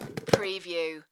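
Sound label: Typewriter Open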